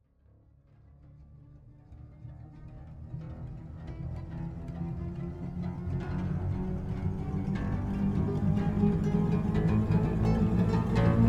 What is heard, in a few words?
World of Plucking Shepard; Arkhis